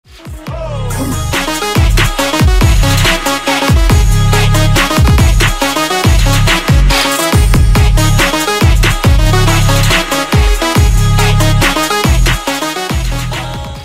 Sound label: Arabic Trap